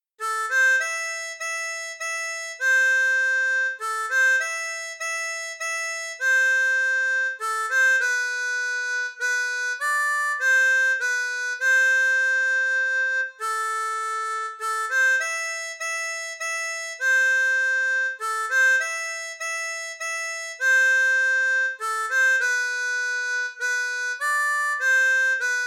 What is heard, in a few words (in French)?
Valse finlandaise, harmonica; Folkloriques